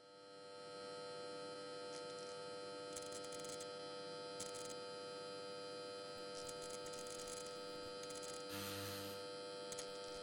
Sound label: Transformer Explosion